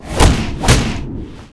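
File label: Fire Shella attack; Gamigo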